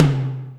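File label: Md Dbl RM, Alesis D4 drum machine tom; 300 Drum Machines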